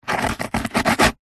office pen biro ball point sign signature on cardboard piece